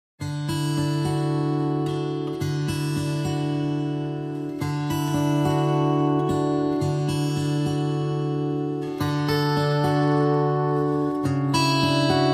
Guitar Only

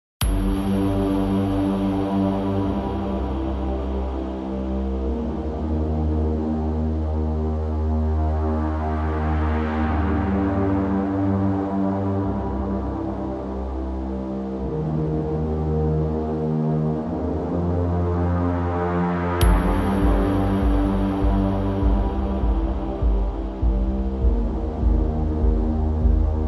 Drums and Bass Mix

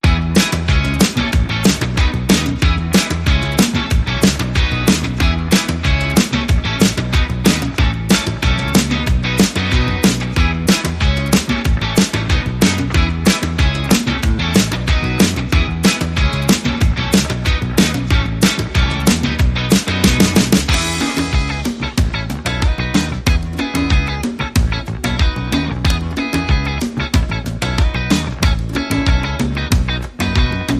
Rhythm

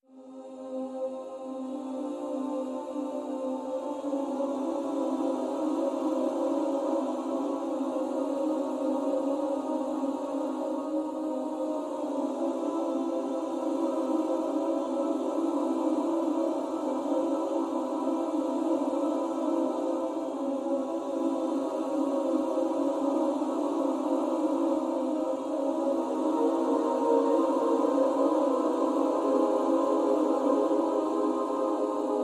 Space Choir

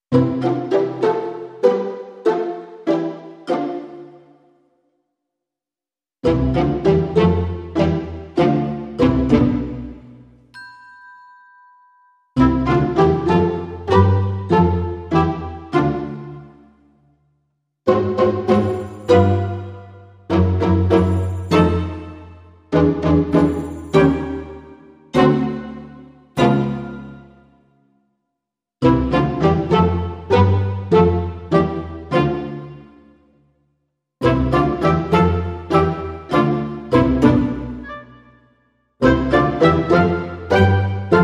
Modern Percussion Full

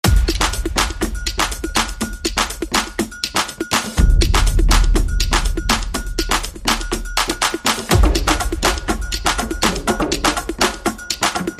No Lead Synth

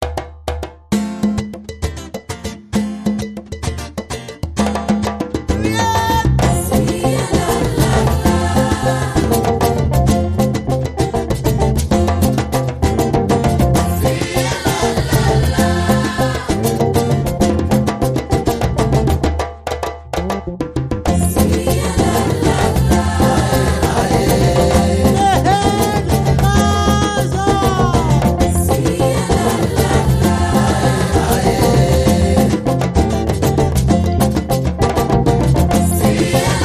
Jungle Funk

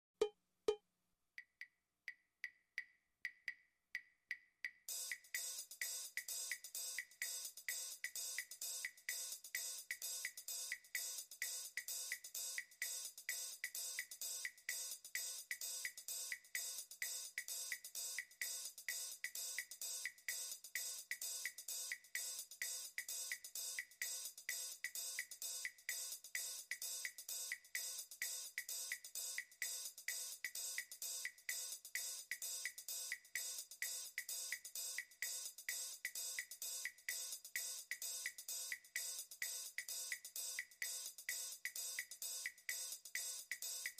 Cuban Loop

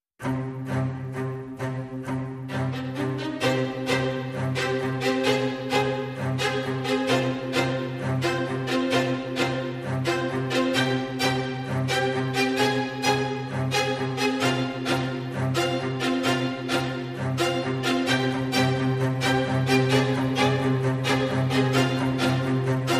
Strings Mix

